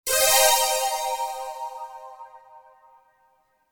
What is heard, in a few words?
Alert